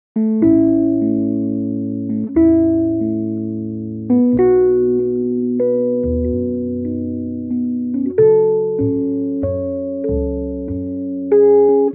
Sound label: Instrumental